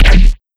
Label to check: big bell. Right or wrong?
Wrong